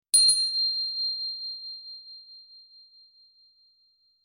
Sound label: chime; neko